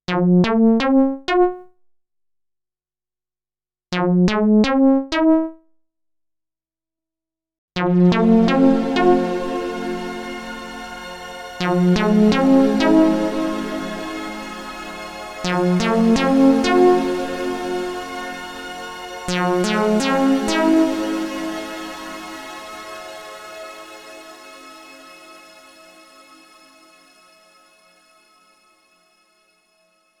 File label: luminance on analog synth